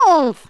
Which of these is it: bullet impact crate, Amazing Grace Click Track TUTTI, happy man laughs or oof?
oof